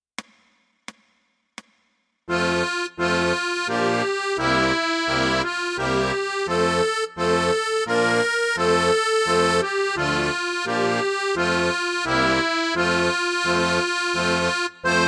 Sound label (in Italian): Inno Inglese Fisa